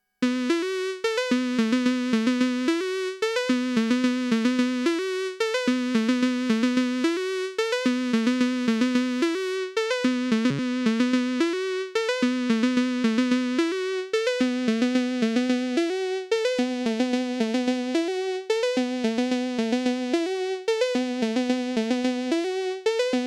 NTS-1 mkII Lead Phaser

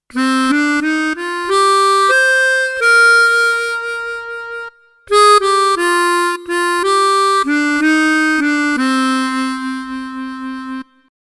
Yamaha Sonogenic SHS 500 Harmonica

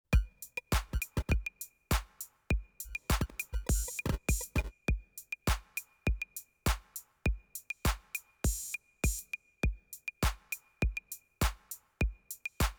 Coarse Filter; Bastl

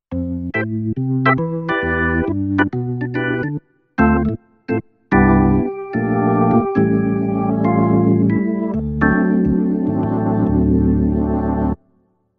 Yamaha P-225 Organ

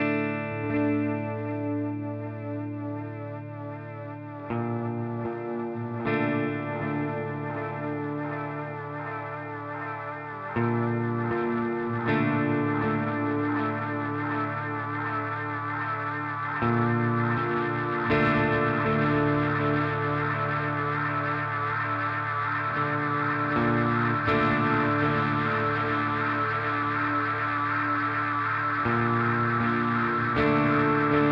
Crazy Tube Circuits Time MK 3

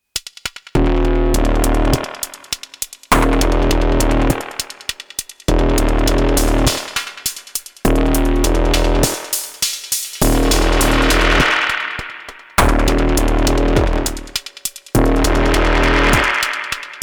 Soma Pulsar 23 Patch Dat Bass

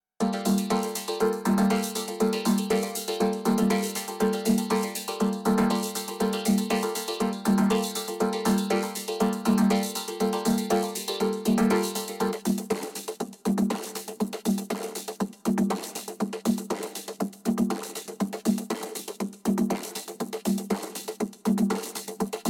Vocoder Drums; NTS-1 mkII

